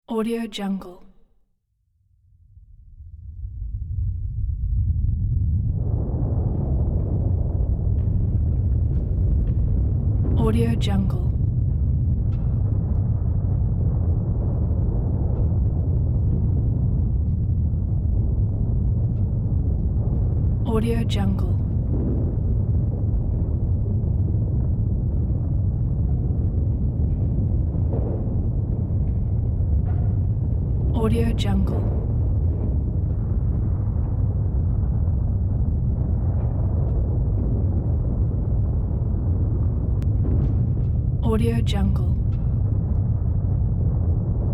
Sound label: distant volcano eruption